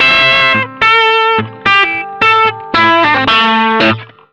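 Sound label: blue riff, Vintage Blues Guitar; USB Soundscan vol.22; AKAI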